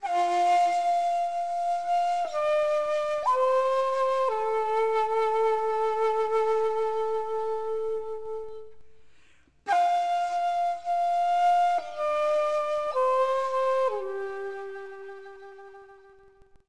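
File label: SHAKUHACHI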